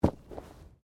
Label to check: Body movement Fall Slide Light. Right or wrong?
Right